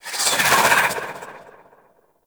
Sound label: Spear Projectile, Weapons; Warframe; Tenno; Priest Spear